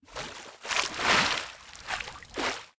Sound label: Scrolling Fish